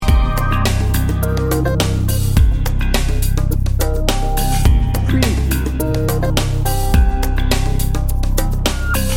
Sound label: loop